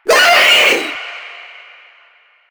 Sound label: Runner Vocal Short Attack; Enemies; Warframe; Proto Infested